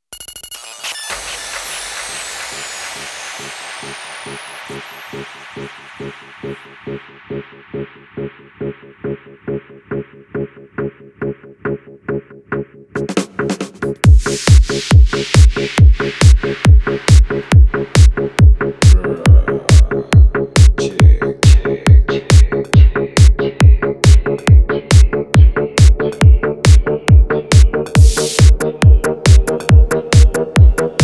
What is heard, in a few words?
trap remix